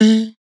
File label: Dry One Shot - A, Tonal Vocal; Cymatics; Euphoria Vocal Sample Pack